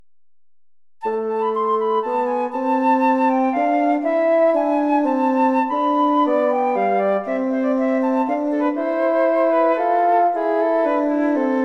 pequeña aria para flauta fagot

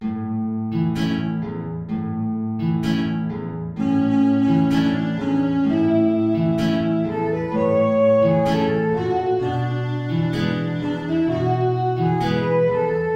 tango

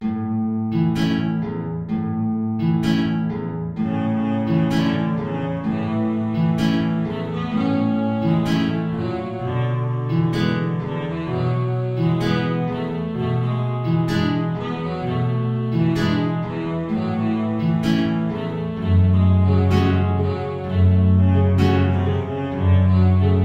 tango

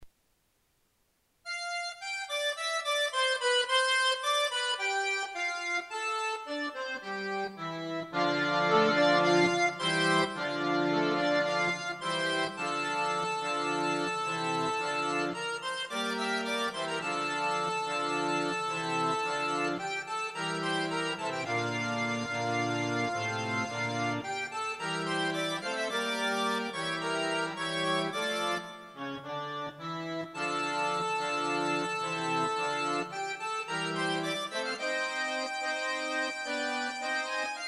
tango

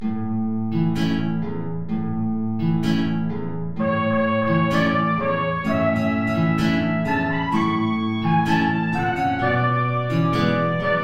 tango

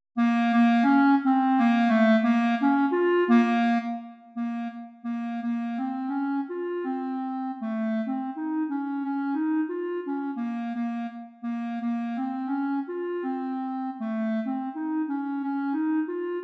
virgen caminando duo para clarinetes

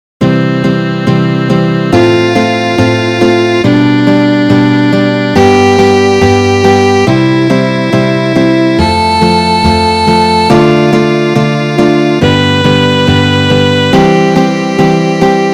ejercicios; Ferdinando Carulli

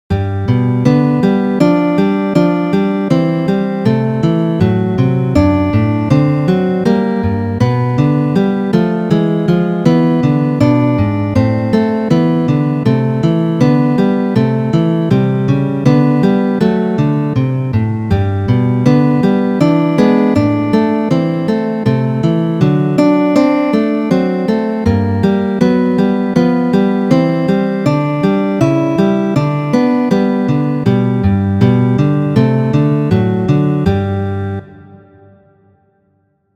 guitarra duos; Ferdinando Carulli

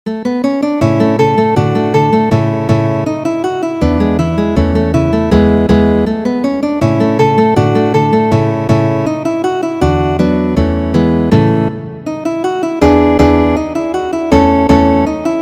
contradanzas; Ferdinando Carulli

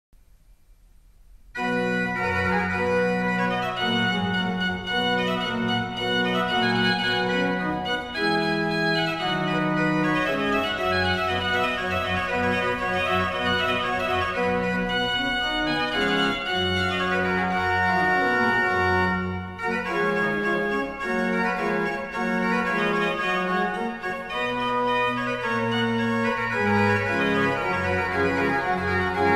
sonata organo; zipoli domenico; sonata del principe; libro manuscrito sones organo 1746 hallado san rafael

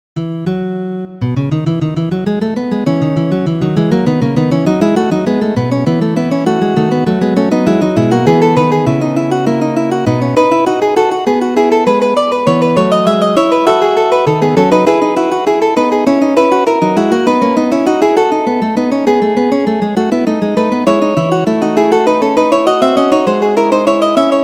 fuga; bach johann sebastian; clave bien temperado 2

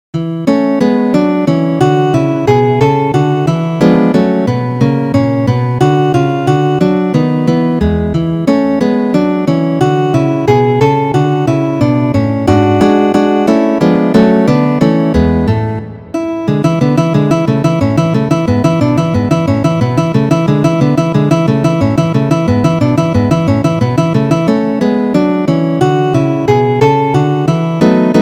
guitarra duos; Ferdinando Carulli

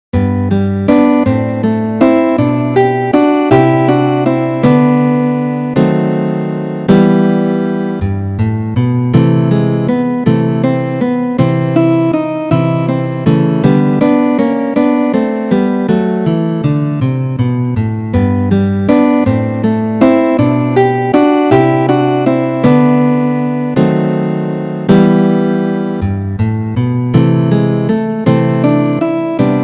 guitarra duos; Ferdinando Carulli

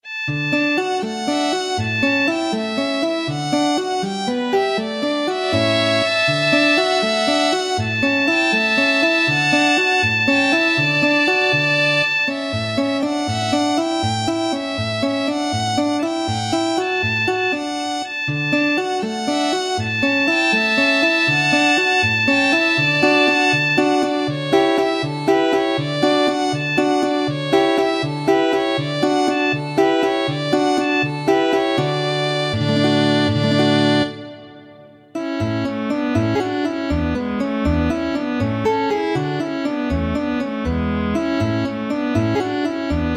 duos violin y guitarra rondo; Carulli, Ferdinando; tres duos violin y guitarra

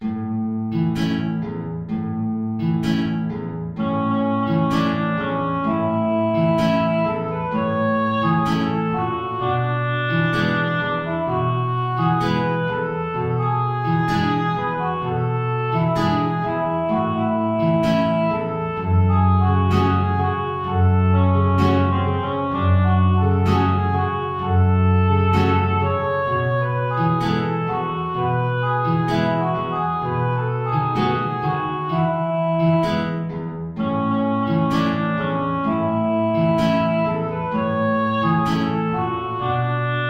tango

